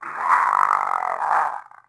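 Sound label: wyvern die; DAoC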